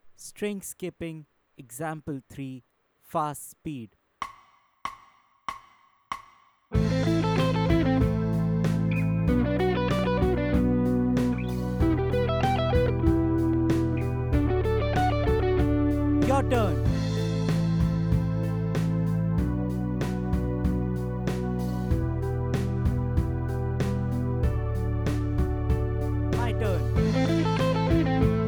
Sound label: String Skipping Playthrough Fast Speed, lead guitar; string skipping arpeggios; The Art of Lead Guitar